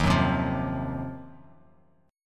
D sharp Minor 6th Add 9th D sharp, chord; strummed; JGuitar

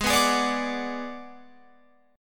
Ab Minor 7th Flat 5th Ab, down, Guitar, Open F; Abm7b5; strummed

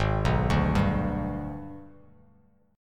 Ab Minor Major 7th Flat 5th Ab, Bass Standard; arpeggiated; 4,2,0,0; JGuitar

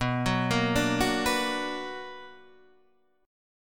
B Minor Major 7th, BmM7 chord; arpeggiated; JGuitar

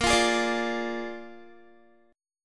A sharp 7th A sharp, Guitar; A#7; strummed; 17,20,20,19,17,x

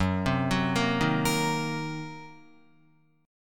Gb 6th Flat 5th Gb down Guitar; arpeggiated; Gb6b5; JGuitar

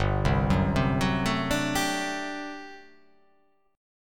G Major 7th Suspended 2nd Suspended 4th G down

